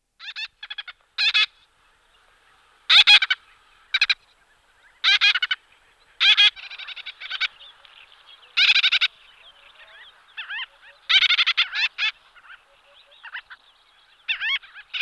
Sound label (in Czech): Rybak cernozoby Gelochelidon nilotica